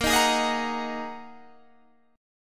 Bb 7th Suspended 4th Sharp 5th Bb down Guitar Drop D